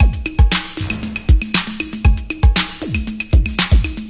funky feel, Drum loops Electro

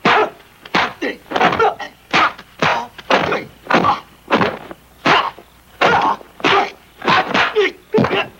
martial arts

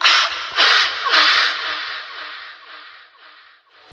"ah ah ah", male whisper

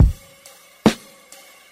boom click bap click, boombap drum loops

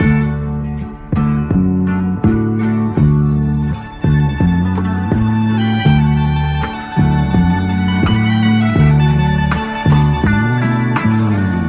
guitar, Loops Phonky

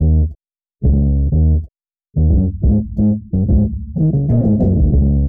bass glob onto this, Loops, Phonky

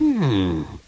hmm, human; Voice; ooh ahh

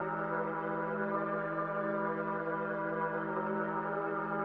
keys, Sad

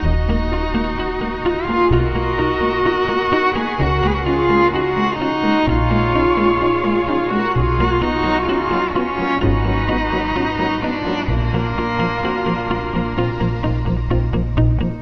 strings, Sad Loops

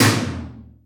industrial, toms; Real

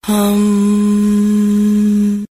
hummmm, Voice; Notes

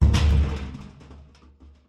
bar, Ticks, Misc Percussion

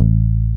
mobile, Bass

